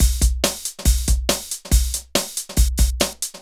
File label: Synth Loop BPM140